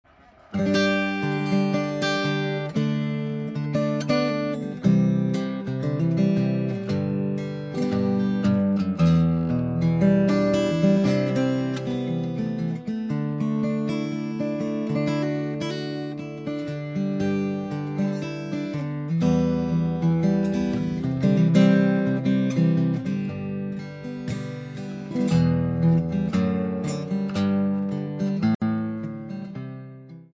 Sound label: OM sample, guitar